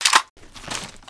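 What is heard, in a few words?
weapon foley reload clip out; de50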